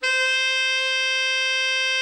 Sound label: TENOR, TENOR SAX; Best Service; Brass Super Section